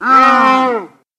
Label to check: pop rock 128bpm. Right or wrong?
Wrong